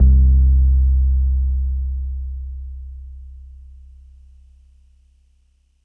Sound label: Sub C2